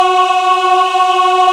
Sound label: VOI AAHH; Sound & Vision - Gigapack I CD 2